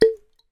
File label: foley cork pull out from wine bottle